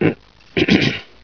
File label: tram cough, scientist; svencoop; echoes